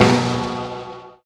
near Win Loose; wild wild west

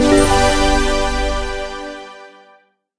small Win; dazzle

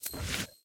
sym travel; serengeti kings

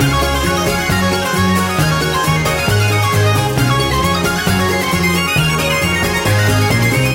free spin loop; irish pot luck

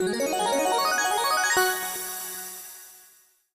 large Win Count Up; fireflies

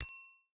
count Up End; koi princess

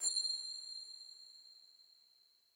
wild coin throw; irish pot luck

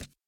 reel Stop; warlords

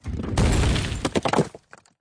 explosion; eldorado

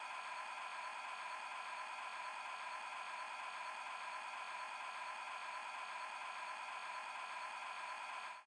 auto play; dracula

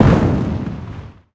wild Appear After Explosion; reef raider

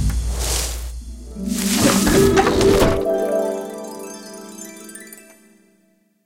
wheel appear; irish pot luck

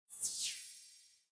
firefly; fireflies

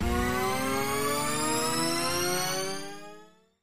respin snd; king of slots

